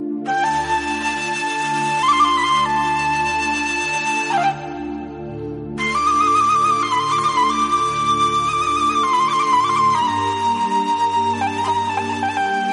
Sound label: turkish flute, ringtone